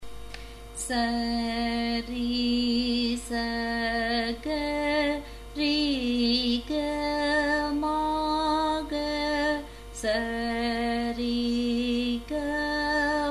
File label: Dhatu Varise, A sharp